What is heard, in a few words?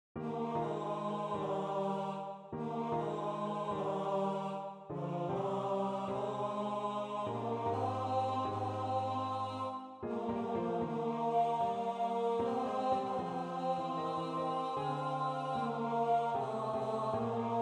tenor